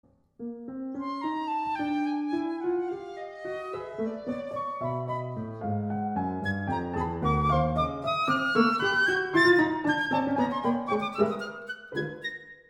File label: Sonatine allegro, piccolo; J.Feld